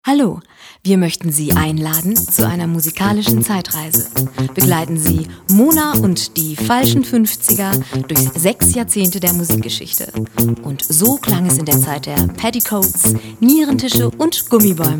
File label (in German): Schlager Rockn Roll 50 60er Jahre